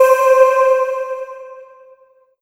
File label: Space Voice C5; Korg NS5R